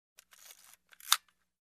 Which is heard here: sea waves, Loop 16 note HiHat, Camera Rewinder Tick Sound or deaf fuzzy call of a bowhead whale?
Camera Rewinder Tick Sound